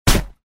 cactus shot; Blackeye

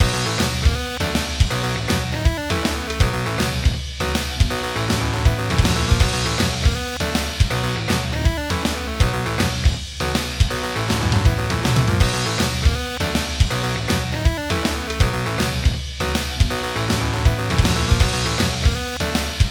3d Music

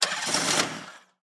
Golfcart Ignition

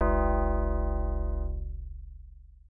Coin Player; arcade; gauntlet

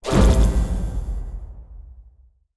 Beacon Active; we are the dwarves gamerip 2016

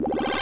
Jump; Oneshot Jumperz

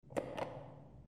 Button

